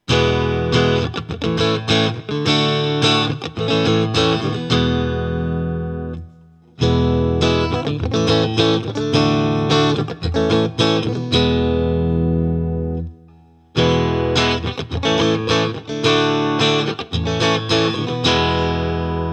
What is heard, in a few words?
Guild S284 EMGs; Guild S284 Aviator with EMG pickups